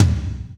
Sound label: TOM TOM, Roland